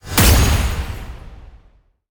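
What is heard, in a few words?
Gorilla explosion; monster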